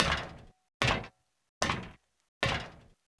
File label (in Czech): kovo chuze; Mafie 1